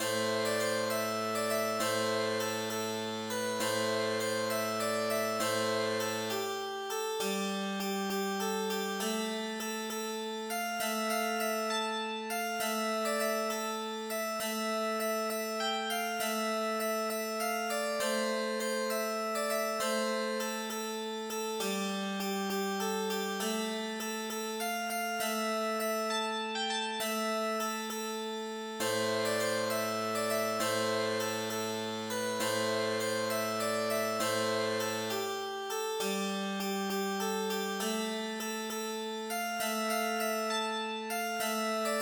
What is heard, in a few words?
Irish Air